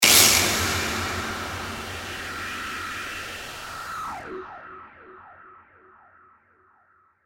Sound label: Hit Distortion Reverb; Production Element; Sound Design